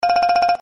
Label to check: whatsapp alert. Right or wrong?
Right